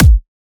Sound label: Kick Dubstep